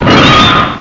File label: R XPLODE